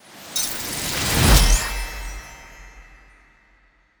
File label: Reward